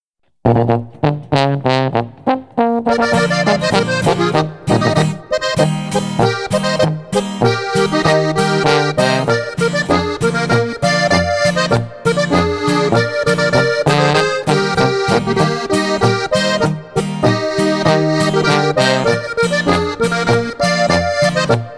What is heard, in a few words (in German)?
Boarischer